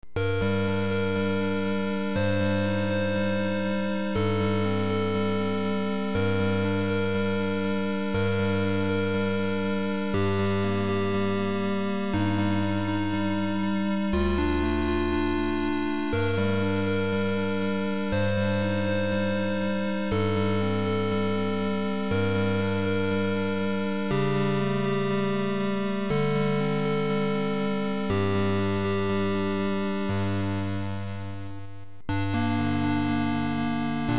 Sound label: Create a new character, Game Blaster; Ultima 6